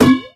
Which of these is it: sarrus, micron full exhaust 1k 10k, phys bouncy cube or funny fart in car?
phys bouncy cube